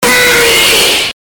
gag

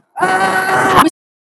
big scream

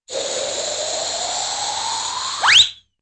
suction and wheet

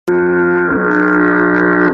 ngeee oooooggg

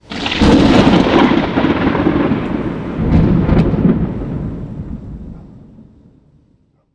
lightning

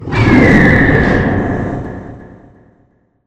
griffon cry loud

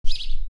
house sparrow

